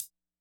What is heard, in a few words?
disco hihat